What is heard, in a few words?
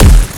Kick Gravel Punch